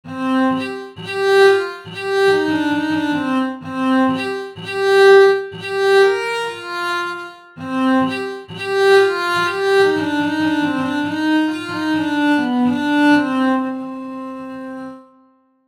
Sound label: Viola d amore